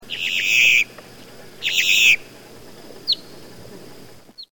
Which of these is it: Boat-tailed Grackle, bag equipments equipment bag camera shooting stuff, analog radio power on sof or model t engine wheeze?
Boat-tailed Grackle